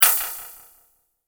multimedia rollover, Multimedia Rollover Tone

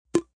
Blip; Beeps; multimedia

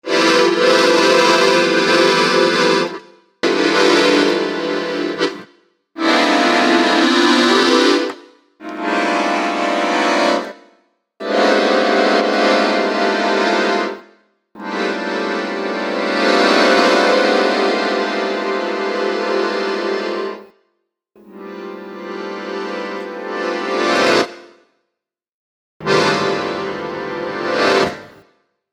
Accordion, Various random accordion sounds.; Keyboards

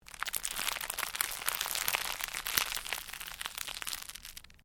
Cellophane crinkle

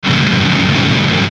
Distortion Scrape Static Hum; Blastwave

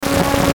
Static Hum Crackle; Production Element Imaging Element Accent Transition; Blastwave; Static Sound Effects

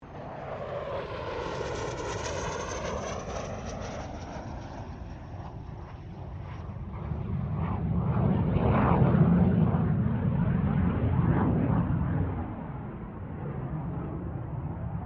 AIRCRAFT CA-27 SABRE PASS DISTANT

